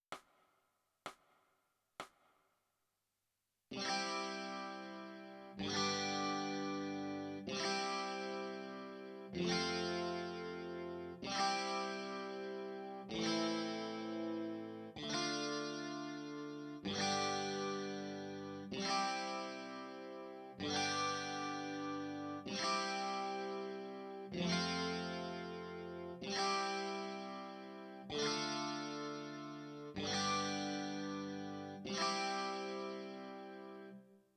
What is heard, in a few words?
E Guitar